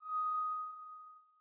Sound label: air